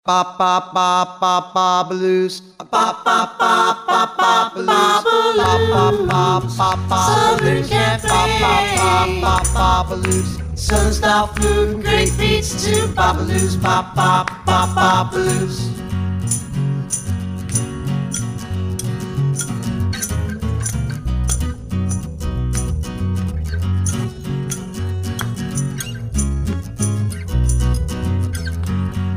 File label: jingle